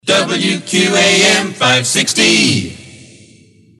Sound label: Moog; WQAM; Gwinsound